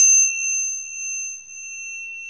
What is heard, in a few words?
NEPAL, Nepal Cym; Club-50 - Foundations Roland; ExotcMetal